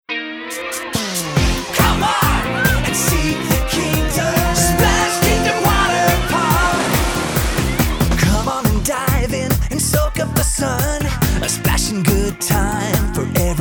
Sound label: Full Sing; Splash Kingdom